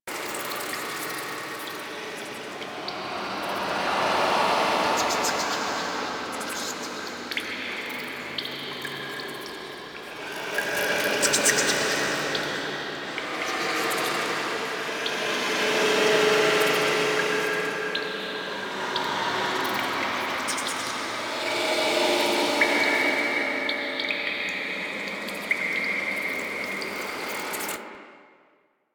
Amb A, Interior, Ambience; Golden Maw

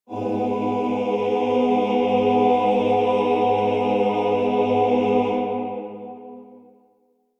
Requiem Mod Choir; Warframe; Kuva Lich

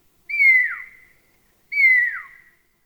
Night Creature Medium; Ambience; Night One Offs

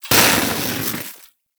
Smithy Forge Fire Coal Burst; Soul Plane

